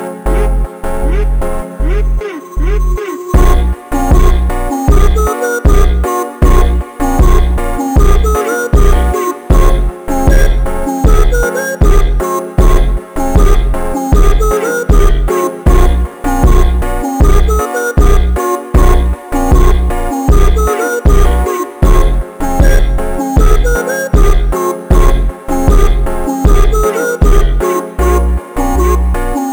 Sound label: SUPER SLOWED